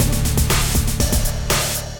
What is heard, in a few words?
Scorched Kit, Drums; Ableton; Digicussion 2; Scorched Kits